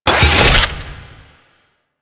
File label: Fortress Gun Mounted Turret Deploy; Ambience; Warframe; Grineer Fortress